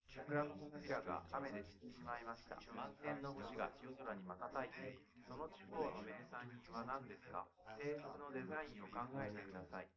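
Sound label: male, live recording 250ms 5cm; SiSEC11; underdetermined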